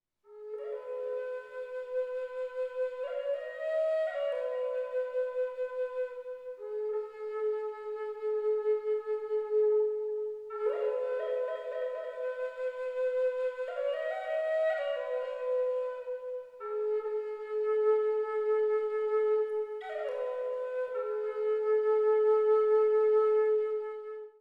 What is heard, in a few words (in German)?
A moll Flöte Zedern